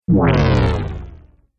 Dubstep Audio Laser; Twistedtracks

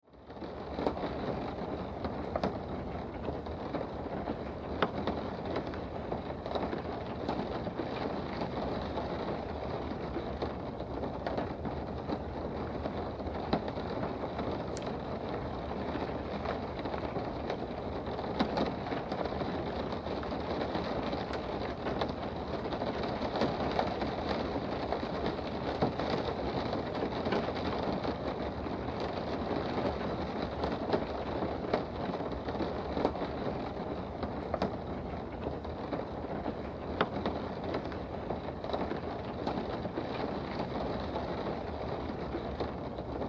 Weather Rain Car Window Interior Light

